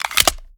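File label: pistol reload empty extended mags large mag in; gmod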